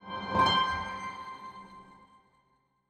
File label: small win; netent